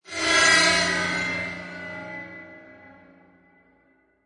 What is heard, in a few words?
Digital Flange Whoosh, Scifi; Scifi Production Elements, Set 1